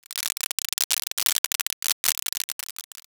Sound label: applause